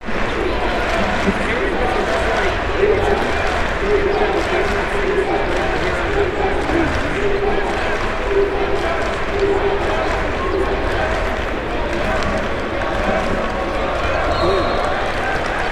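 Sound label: Arena Crowd Noise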